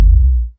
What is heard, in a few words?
enemy hit; Sfx; freepixel; Tilter